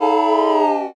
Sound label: commotion